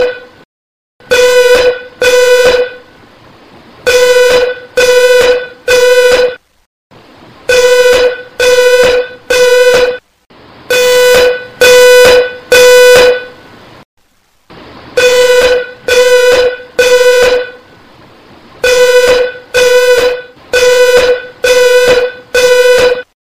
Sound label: Alarm Tone; iPhone